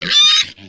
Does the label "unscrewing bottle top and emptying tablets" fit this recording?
No